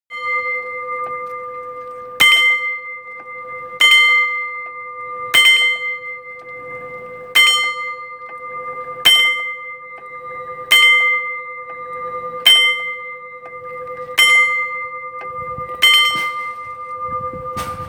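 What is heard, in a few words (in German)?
Barriere Läutwerk; CH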